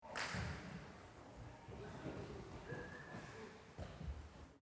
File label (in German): Judo klatschen